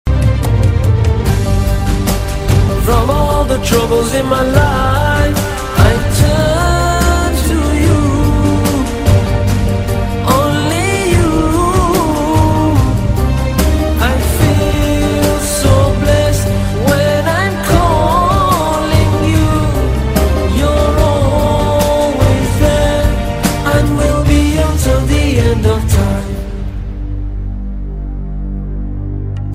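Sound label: english islamic ringtone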